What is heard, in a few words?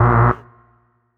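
Rave Synth, Dance Mega Jungle Rave; Best Service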